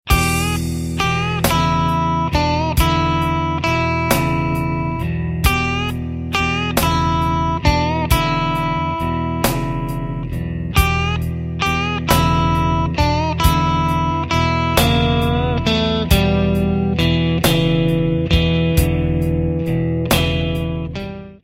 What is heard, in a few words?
Blues Basics, guitar